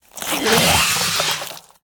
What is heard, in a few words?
Tentacle Attack